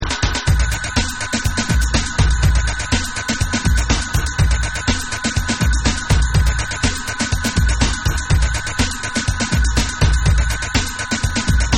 INDUSTRIAL BASS

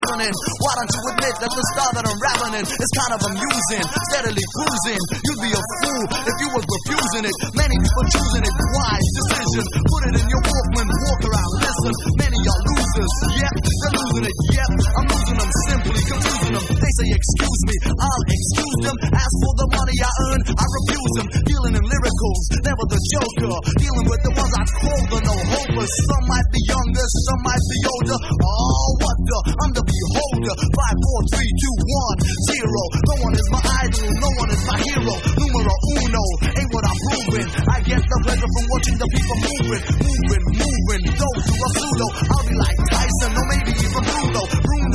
Vocal

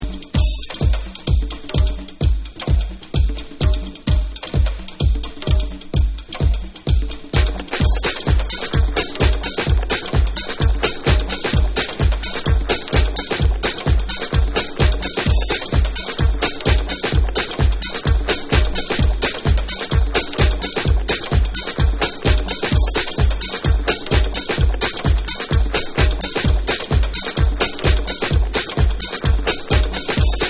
Afro Centric Beats

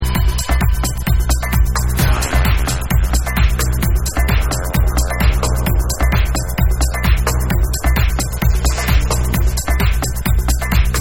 Abstract Drum Mix

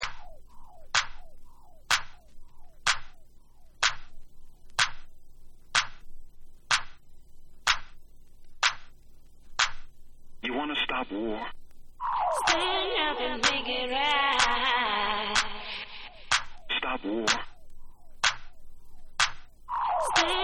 Acapella

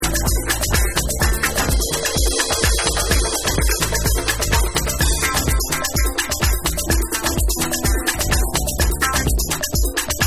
Dirty Dub

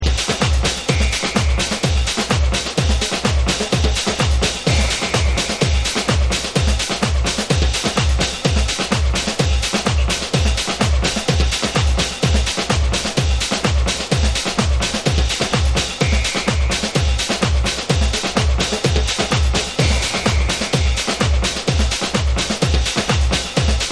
Brazil Beats

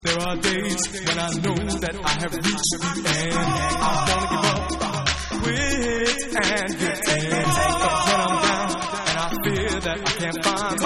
Acappella

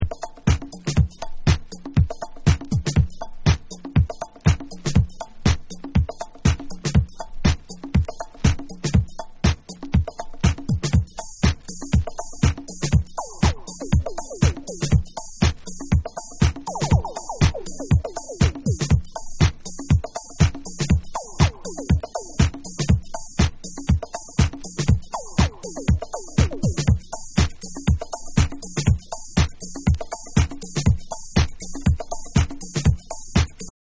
Bonus Beats

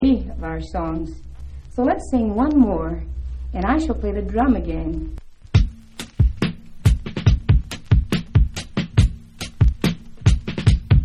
DRUMS Ha

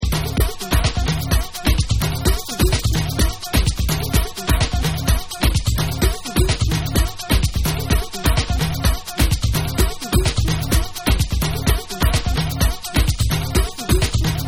BATUCADA OSTINATA

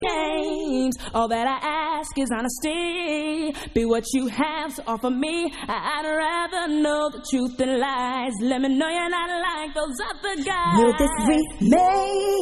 Acapella

